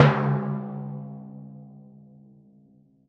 C#2 f, Timpani, forte; PowerFX; Downtown Orchestra; scales

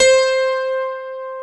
PIA OLD, PIANOS

